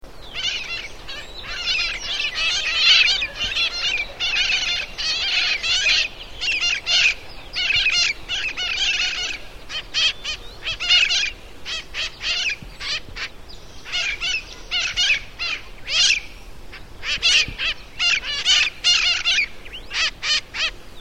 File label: Mitred Parakeet; Birds